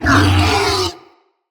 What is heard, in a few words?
Corrupted Pain, Animals; Bromius